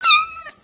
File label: Kitten Meow; creatures organic